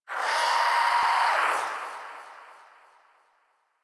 Shadow Vocal Idle; Warframe; Duviri

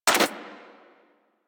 Rifle Mech; Warframe; Weapons; Thanotech

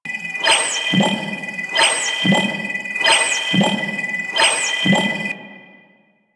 Sentient Jamming Drone Loop; Warframe; DE; Vehicles; RailJack; RailJackEnemyShips; Sentient Ramsled Agent

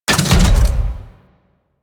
Mech Crounch Start; Nechro Mech; Vehicles

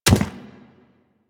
Chimera Step Default

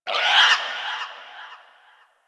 Forest Distant Creature Medium Cry; Warframe; Grineer Forest

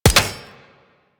Fishing Spear Damage Hit; Warframe; Venus Fishing Spears

